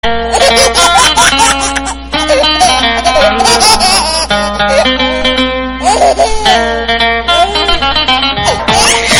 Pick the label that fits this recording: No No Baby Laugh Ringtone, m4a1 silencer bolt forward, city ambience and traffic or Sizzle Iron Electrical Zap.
No No Baby Laugh Ringtone